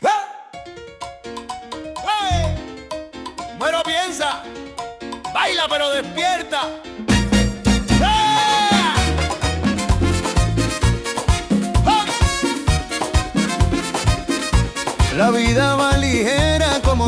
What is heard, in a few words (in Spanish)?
MERENGUE